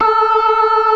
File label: ORGAN, NO PERC